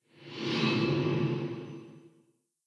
Vanish; blue archive

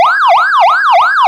yelp, sirens; code3 excel

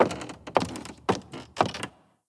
Foot Step; blue archive

